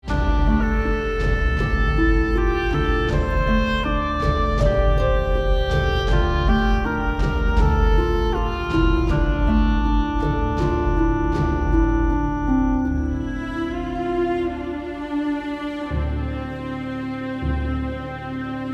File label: Quiet Oboe